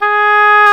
Oboe f; Roland L-CDX-03 Disk 1; Oboe 4-8

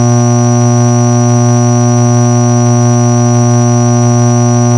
TRANS REAL, BUZZ HUMZ; Spectrasonic Distorted Reality 1

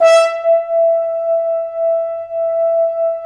BRS, FHns Accent; Roland L-CD702; Accent-Swell

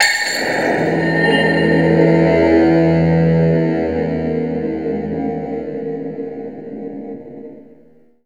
axe E octave; COMPLEXHITS

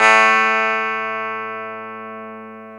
GTR E12, Ac 12+ E.12; Club-50 - Foundations Roland; Dual Guitar

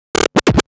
Scratch Fills 140BPM

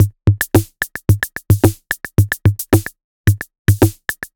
retro drum machine Loops 110bpm; Tape B; MT40

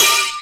FX Hat; Digital Sound Factory Xtreme Lead

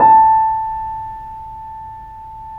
The Piano, A; InVision Interactive - The Piano; BOESINGSOFT

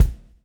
swing

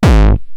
Roland TR-909 BD; Drums; BD Selection

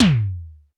Roland U220 Electric Set, Drum Machines

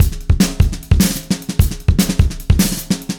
Acoustic Kit, Drums & Percussion

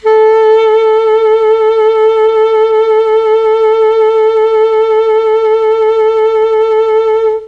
A2 ALTO SAX, Mellotron; Mike Pinder - Mellotron Archive CD; CHMB ALTOSAX

